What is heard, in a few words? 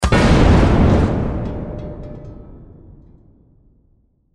Explosion Propane Canister Tank Metal Flame Blast Fireball; Military; Warfare